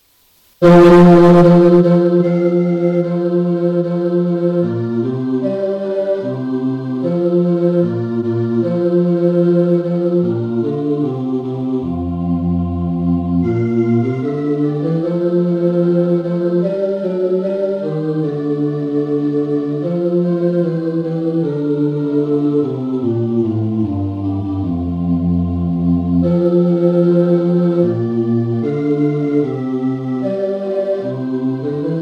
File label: Bass; Fine Knacks for Ladies; rehearsal